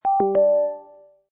Multimedia Short Audio Signature, Very short jingle, musical s; Very short jingle, musical sound effect, audio ident. Suitable for splash screen, syst; Sonic Logo